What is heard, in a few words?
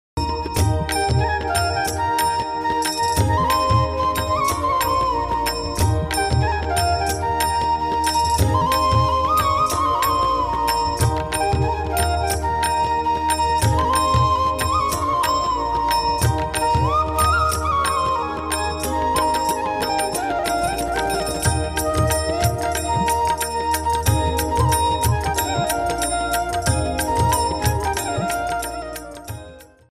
hanuman chalisa instrumental; hanuman ji whats app status